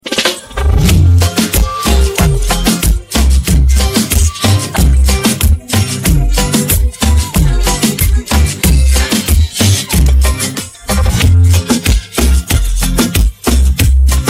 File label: instrumental